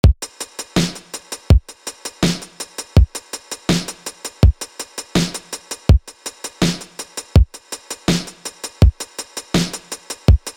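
hats movement via kick n snare ducking, synthwave electronic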